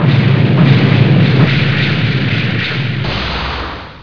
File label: EXPLODE